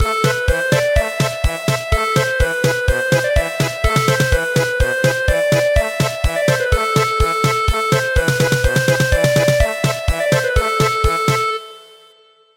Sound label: German Polka, midi traditional; Why why you did not come